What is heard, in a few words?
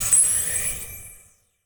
Transition Whoosh Warble; Production Element; Title Transition; AdobeCC